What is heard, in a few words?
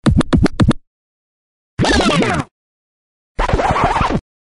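scratch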